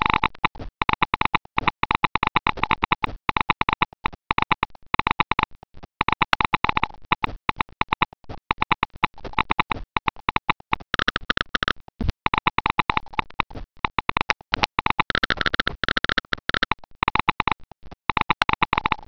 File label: geiger; de color world